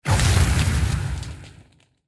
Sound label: item wyvern heart skill